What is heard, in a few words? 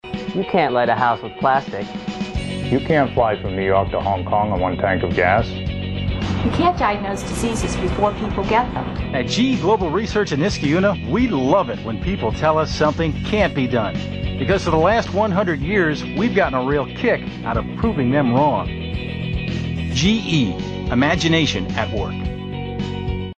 Kick